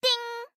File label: Ding